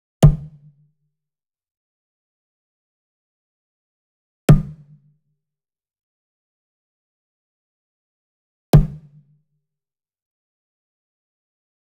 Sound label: Wood Percussion Wood Drum Mounted Hit Slap Near; boom library; Cinematic Strikes Construction Kit